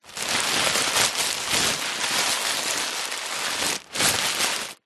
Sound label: plastic bag thick reusable handling rustle long; foley